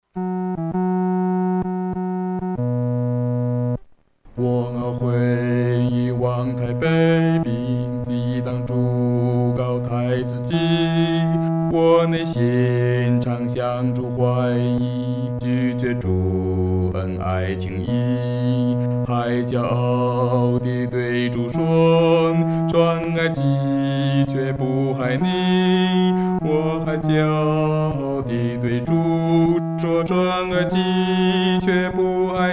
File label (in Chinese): 独唱 第四声; 何等痛悔